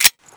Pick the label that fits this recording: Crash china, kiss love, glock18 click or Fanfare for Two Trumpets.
glock18 click